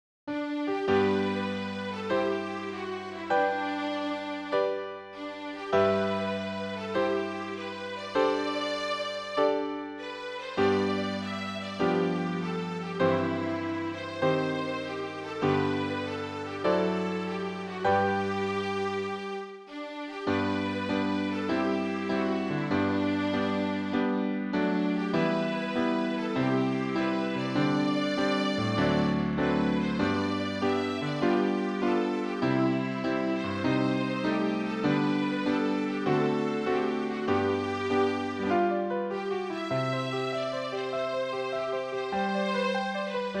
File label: piano